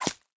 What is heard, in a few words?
hit; dapopinata